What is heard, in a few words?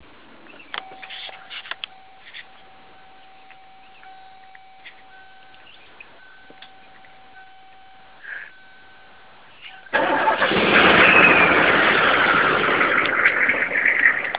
startup, hummer; cbenson